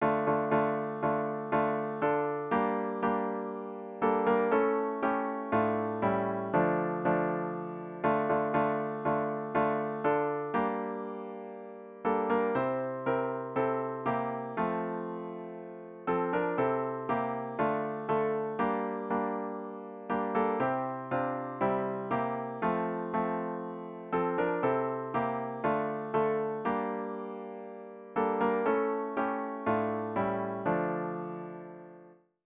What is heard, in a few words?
midi